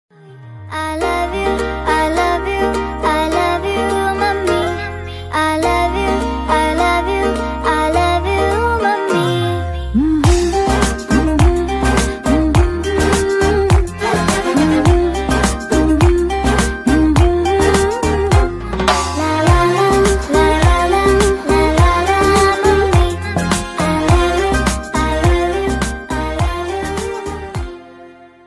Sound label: i love you mummy ringtone song beautiful ringtone song